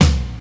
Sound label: rich lows snare drum sample d key; Duration 0:01